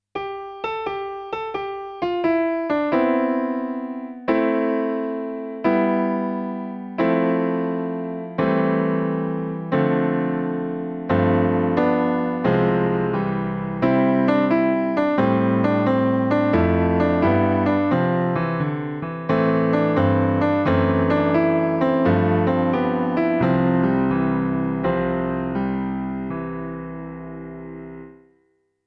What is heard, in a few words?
karaoke